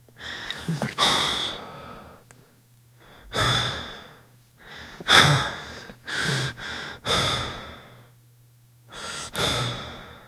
years old man holding, close mouth

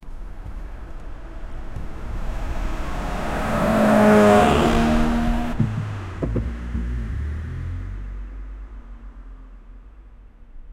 car drive over speedbump

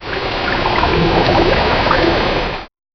a water spash from a, car

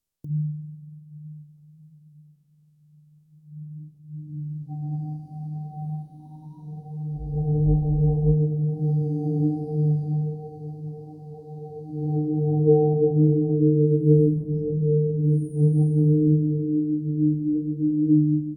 mysterious magic humming

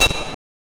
whip

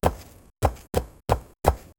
a rhythmic soft sound of

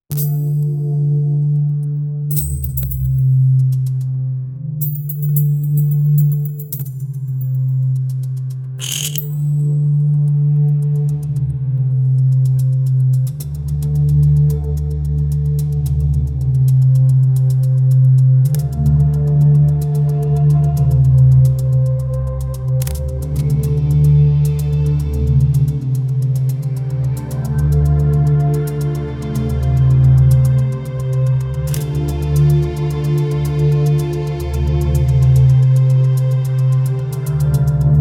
subtle espionage like underscore

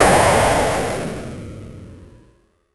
a pixelart game sound of

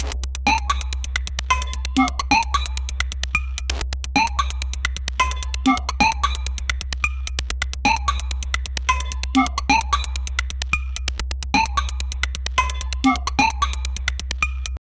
a steady suspenseful ele

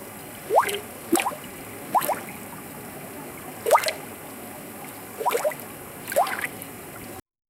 trickling water gentle; country

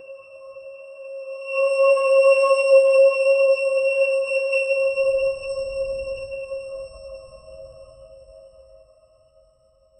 dark echoing cave ambienc; crystal shimmer

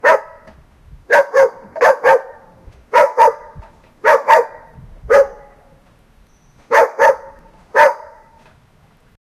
dog barking in the rain

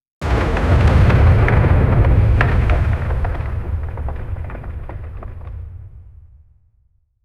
sound effect of a massive, ancient

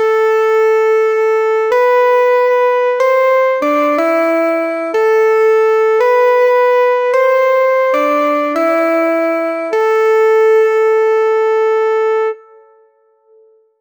air synth melody a minor

